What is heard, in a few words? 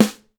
snare